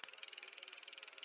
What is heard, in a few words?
bike drive